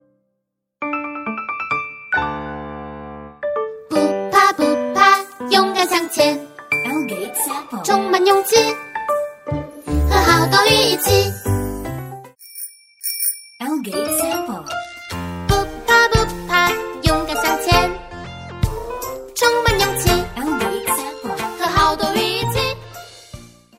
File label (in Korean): 중국여 광고노래샘플 기본톤